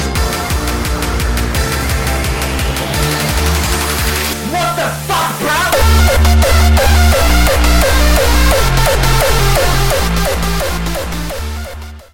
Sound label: hardstyle